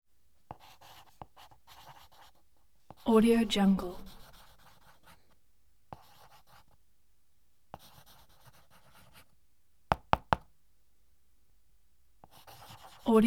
writing soft scraping movements; prosoundfx

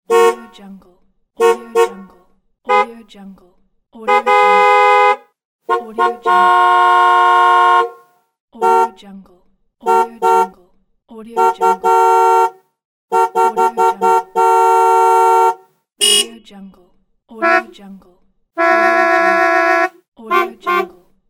car horns

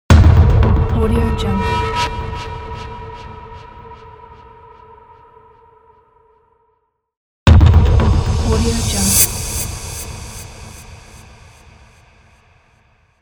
blockbuster trailer transition

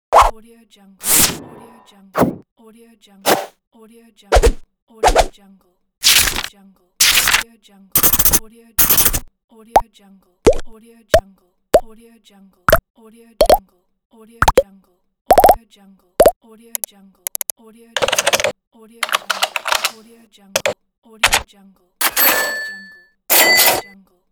infographics pack

